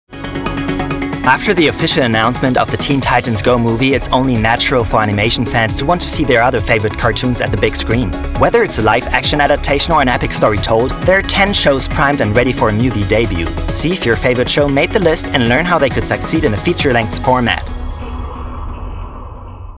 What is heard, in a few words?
progessive house